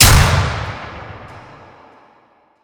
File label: arena lights off